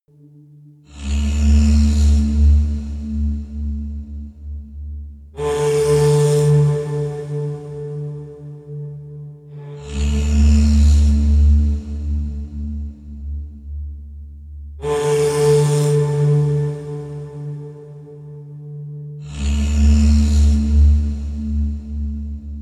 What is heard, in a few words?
whale song, music elements